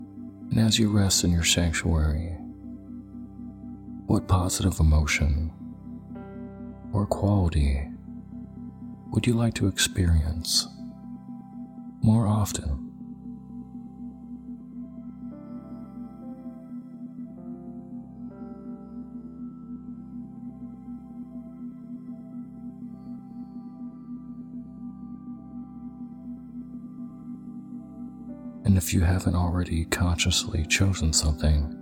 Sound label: Isochronic Tones; Positive Emotions